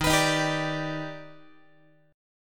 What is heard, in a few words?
Eb Augmented Major 7th, Guitar; Eb+M7 strummed; JGuitar